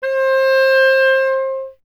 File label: clarinet C, Solo Instruments; Miroslav Vitous - Solo Instruments VOL-1; 90 s Sample CDs